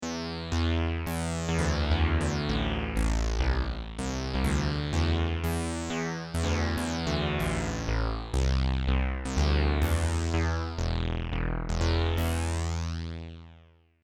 Sweep Bass Saw

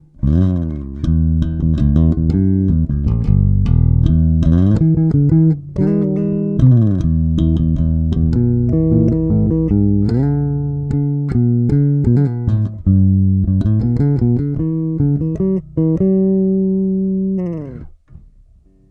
Both neutral

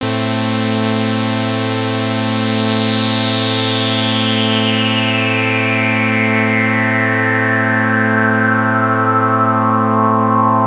Oberheim OB-X Filter Sweep; GForce

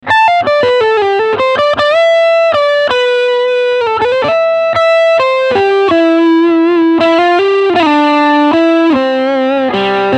TS Full Drive Lead

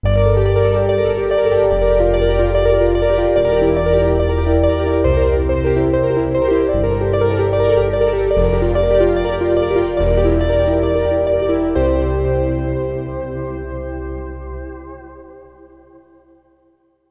Fine Lead

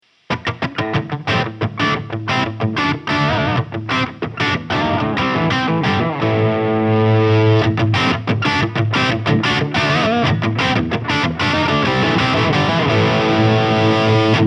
KX300 Lead Volume Drive; Cort

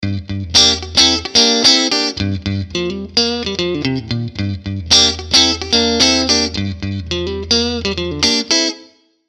Phantom Stratus

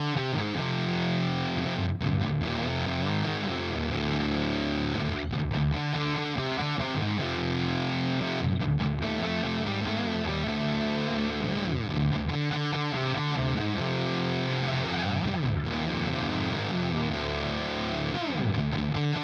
HX Stomp Fuzz Face; Vergleichstest

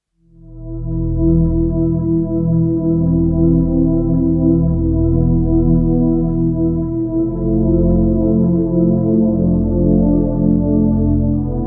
Emax; 1000 mm lens

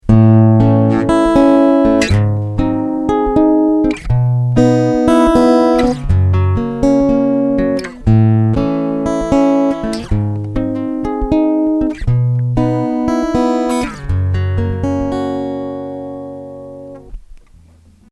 Woodland Finger EQ aus

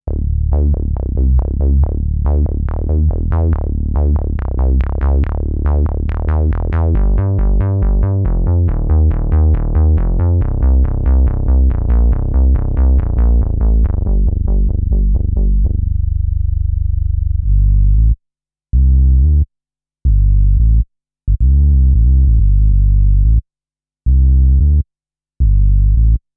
Bass Roland Lucina AX-09

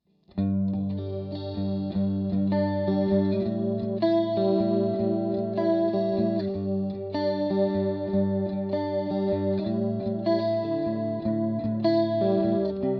Chorus

